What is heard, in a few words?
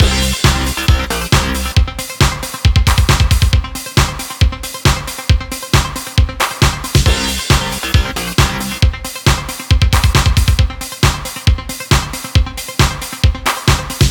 BGM ramen